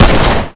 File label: fire, glock; Urban-Coalition; Decay; arccw ud